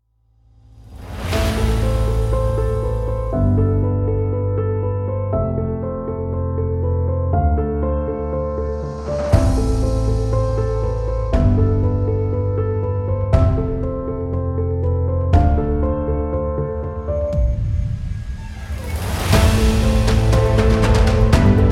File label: Epic Drama